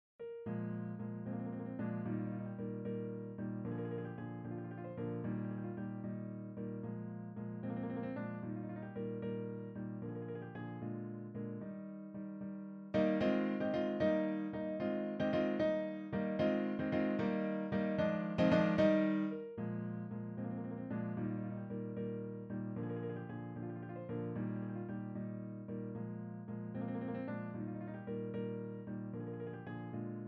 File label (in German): Klavierstuck; Ludwig van Beethoven